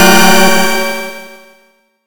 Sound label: Retro Weird Psych